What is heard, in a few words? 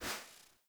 UI Theme Window Close; Zephyr